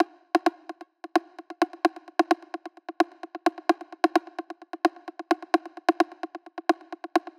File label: Rim Loop with FX